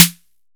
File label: SNARE 808; E-MU Formula 4000 Series Vol. 3; Analog Odyssey